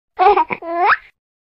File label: Baby Laughing, notifications; Tecno HiOS 16